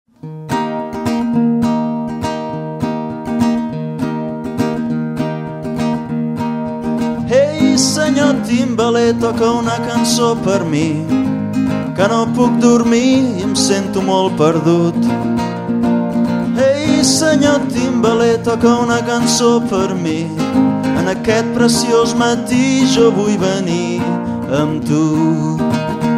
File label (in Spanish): timbaler